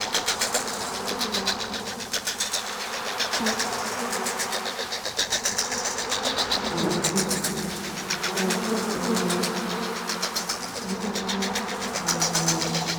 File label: Reroot Resource Pick Up Loop; Warframe; Nokko